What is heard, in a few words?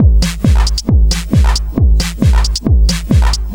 Drums Techno